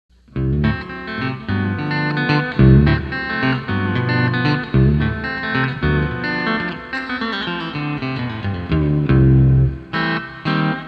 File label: C6th 2 Fingerstyle